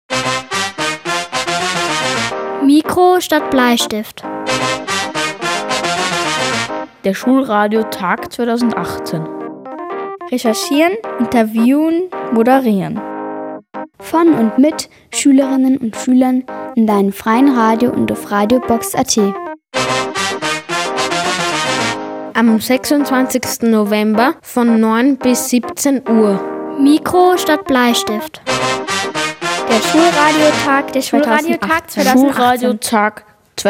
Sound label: Jingle; SCHRAT